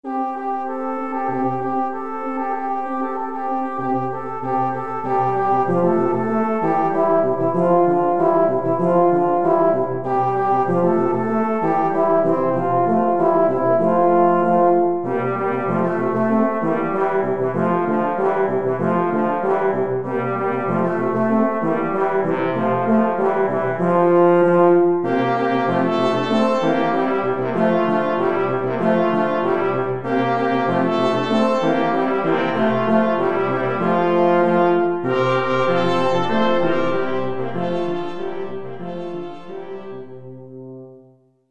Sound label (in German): blechblasquintett